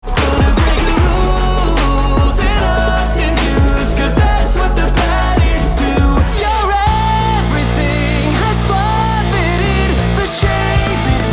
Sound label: Singing